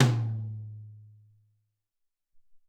12 Tom, Alesis SR-18

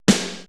drum, 80s Drum Set, DRUMCOMPUTER

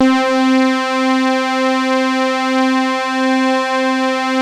TARK SAW, Synth

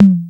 Korg KPR77, Drums

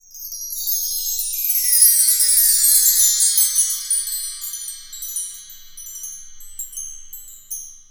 KOMMERZDRUMS

